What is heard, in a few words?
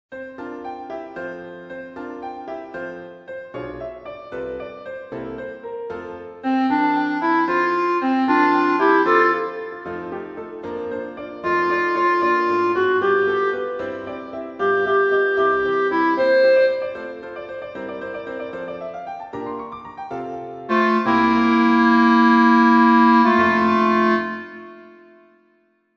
Refrain Tutti; I Cannot Tell